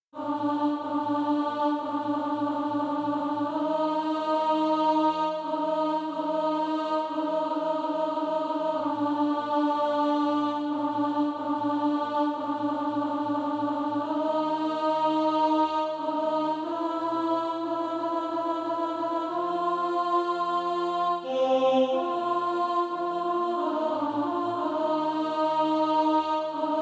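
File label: alto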